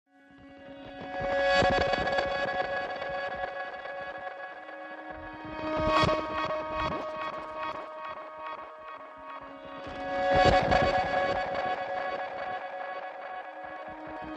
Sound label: No Arpeggio Synth